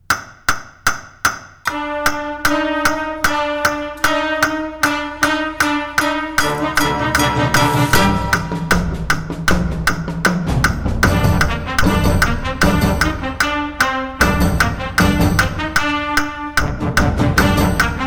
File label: with clicks Fast